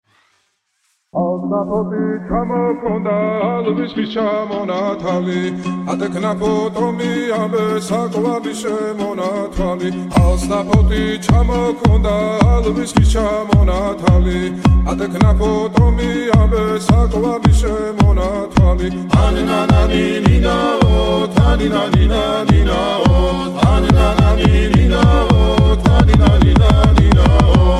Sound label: Gandagana Trap Remix Georgian Folk